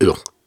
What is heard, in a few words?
Syllables and Imaginary Words, Viking Vocal, Hard